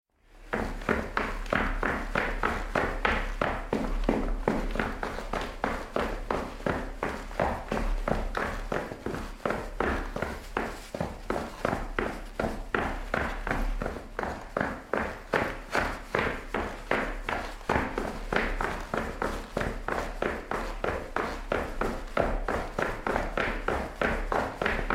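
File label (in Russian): zvuk bega po lestnice derevyannoi, sound of running on wooden stairs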